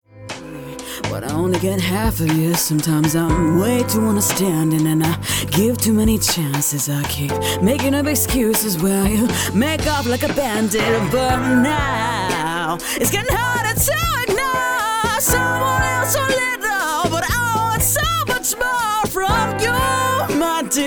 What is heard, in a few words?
Singing, Asian American voice actress; Vietnamese-American voice actress based in the Gulf Coast area